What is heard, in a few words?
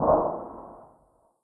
distant, mp5; hl1